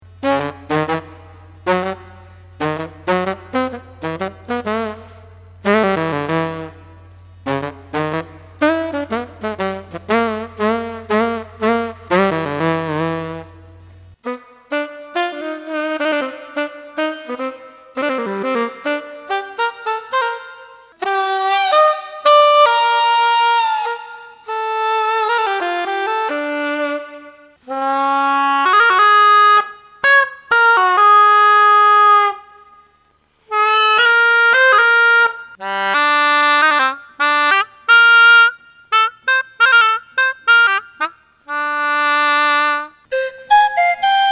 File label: breath